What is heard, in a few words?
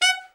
ALT SRT, ALTSAX SHORT; Giga Samples Collection